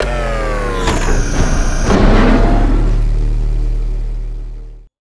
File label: sort lower, mechanical mining